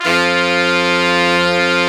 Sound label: BRS, R&R Horns; Roland - Brass, Strings, Hits and Combos